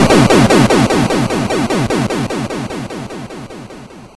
Explosion; Shinobi; Game Gear

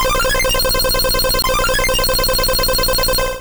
Sky Attack Hit

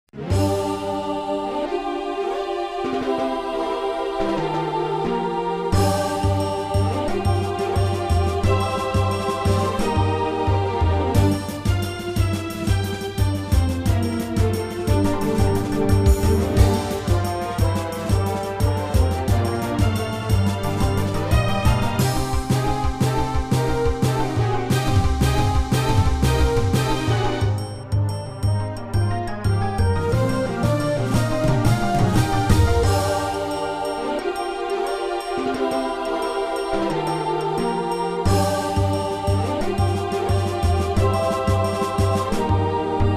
Music Fight

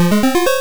Sharpen; sfx; attack moves; pokemon; gen 2; gsc

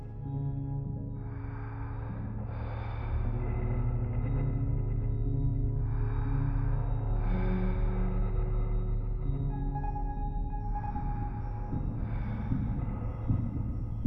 Menu Ambient; breached

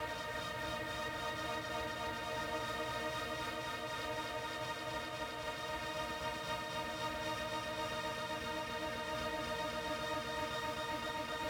Dense Strings; Proteus

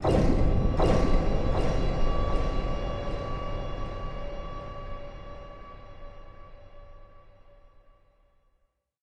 Progress Stinger; mass effect 2; Freedom

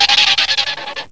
SFX Car Skid; life is a game android

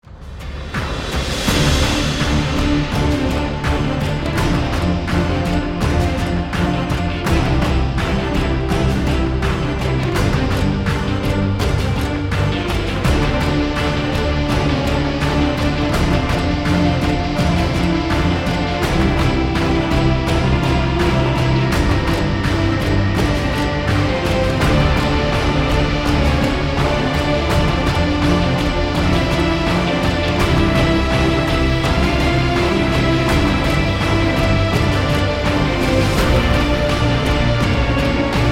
end run; dc whitehouse

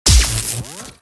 Lightning Ball Explosion

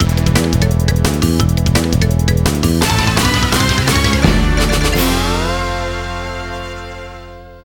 Course Intro Fanfare; crash bandicoot series sound box

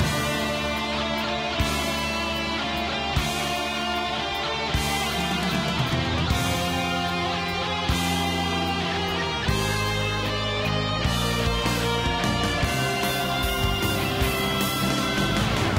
BGM Dungeon Hard

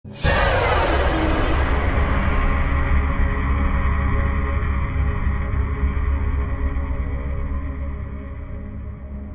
SHOCK; F.E.A.R. Perseus Mandate

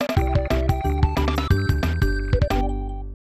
Stage Clear (Trees); Donkey Kong Country 3; SNES

